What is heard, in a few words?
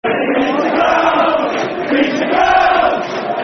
Feet Out Of The Bed, Football Chants (Soccer Songs); Peter Crouch Feet Out Of The Bed; Liverpool Lfc